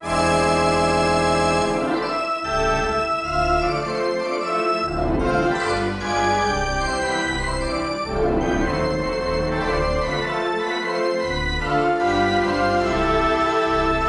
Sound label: Organ Results; American Mensa Academy